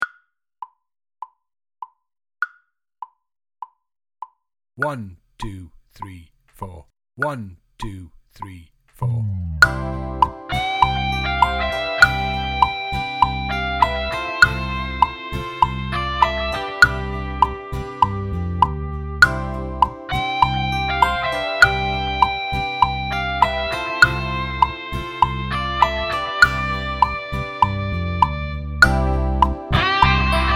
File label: Soft Rock Click, drum kit; Soft Rock Cafe